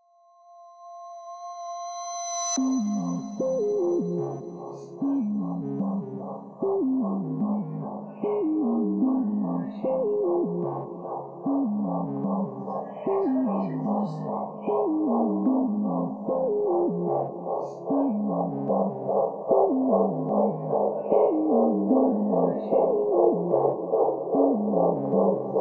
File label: Psychedelic Trance Goa Trance; Psytrance